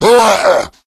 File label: hulk pain; Left 4 Dead; Source Engine; tank